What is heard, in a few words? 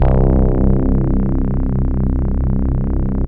Okia Bass